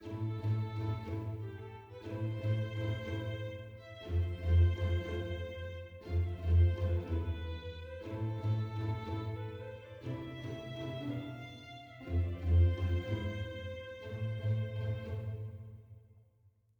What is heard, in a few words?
heroic soft